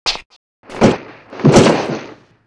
death rifle head; cs wolfenstein

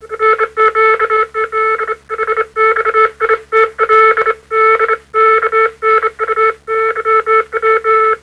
morse; u-boat

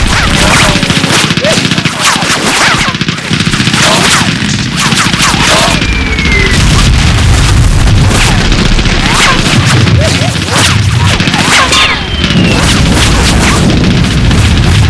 dog only ambience

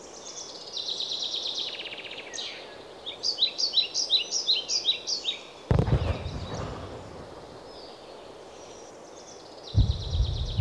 forest

